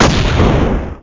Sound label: Explo; Chaseace; 501 Great Games - Volume One; Guildhall Leisure Services